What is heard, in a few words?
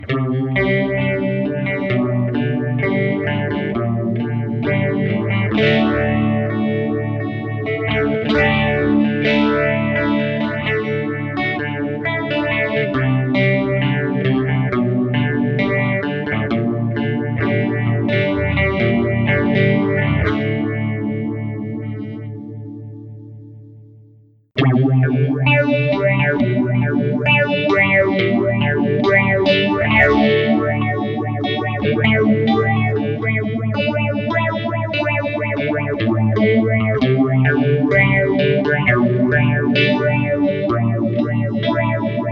envelope phaser; molecular patches